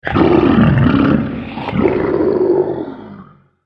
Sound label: Massive Dragon, monster; Monster Sound Effects; horror sound effects